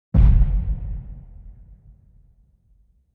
Explosion Large Booming

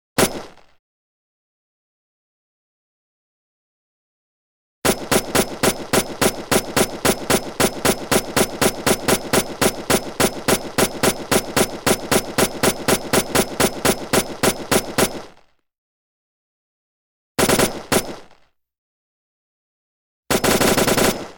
M-1 Automatic Weapon

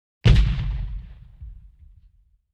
Explosion Large Distant

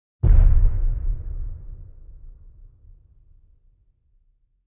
Explosion Large Distant

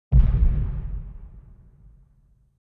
Explosion Small Distant

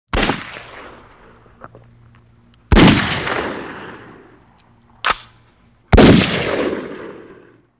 22cal 357cal Magnum Fire

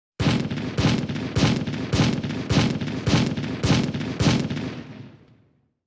25MM Gun Fires Steady

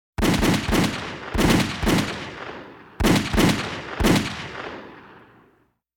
Automatic Weapon Multiple Shots

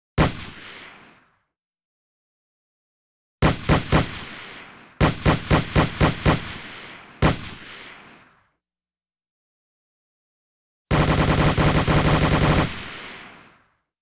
Automatic Weapon

